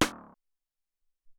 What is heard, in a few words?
Zap